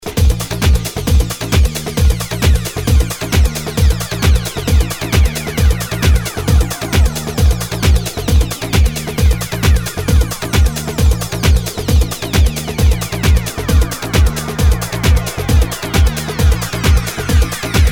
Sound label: feel the drums